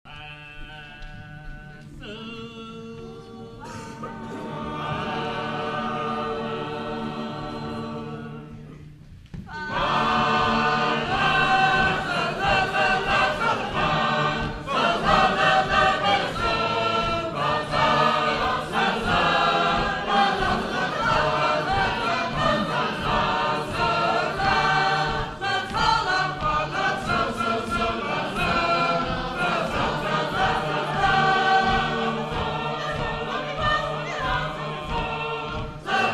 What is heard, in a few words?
Sacred Harp; Portland; 2006 Convention; Ocean